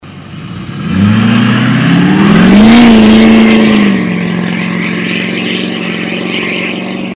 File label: lmm chev takeoff